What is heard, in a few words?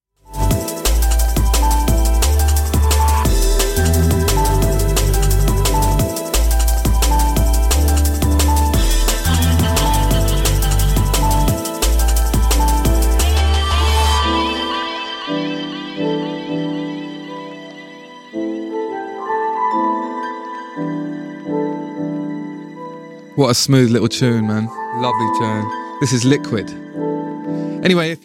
drum sound bassline